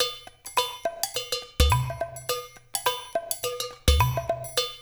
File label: percussion loop